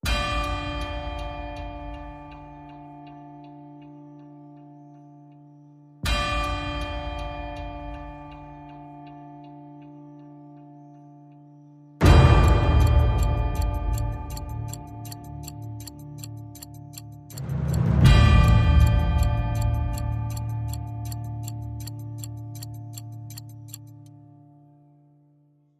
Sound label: Clocklike D